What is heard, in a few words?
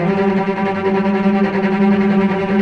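cello G3 tremolo; East West; The Ultimate String Collection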